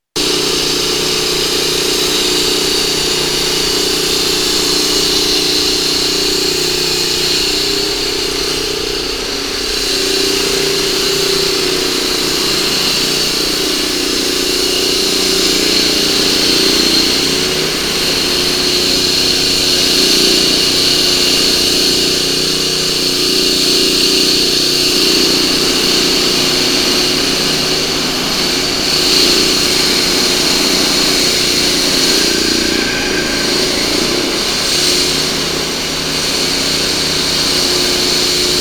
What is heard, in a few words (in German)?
Arbeiten Busterminal Linz